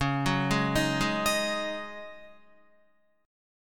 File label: Db Suspended 2nd Suspended 4th, Guitar; 8,9,8,8,8,11; 4F,4A,5C,5G,5C,6E; arpeggiated